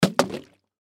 Drink Bottle Drop; Liquid Slosh; Drops; Objects

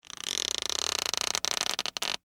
Foley Squeak Stress Slow; Objects; SFX Bible

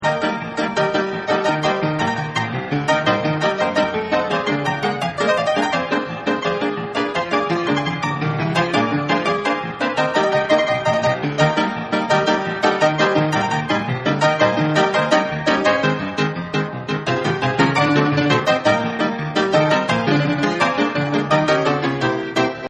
Piano Barrel; Pianos; Musical

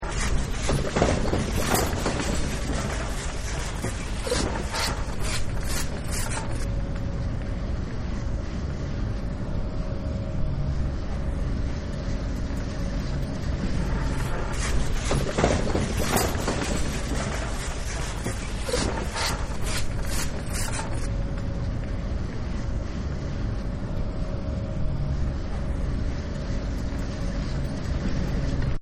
Ski Lift Loop; Skiing and Snowboarding; Sound Effects:Sports

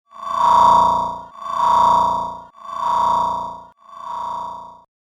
Scifi Echo Pulsar; Science Fiction Sound Effects

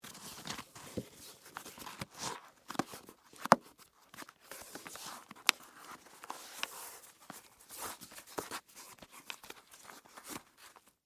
Foley, Boot, Tie, Laces; Dressing Sound Effects; Dressing (Sound Effects:Human)

